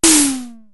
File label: Snare, Drumfire DF-500